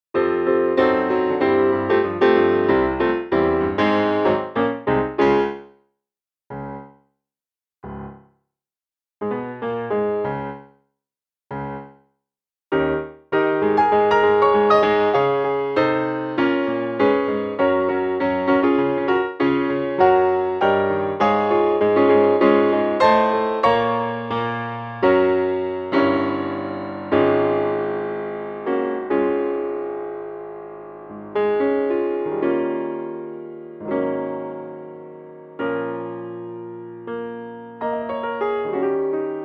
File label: Accomp; Tiana; Mickey Wndr Book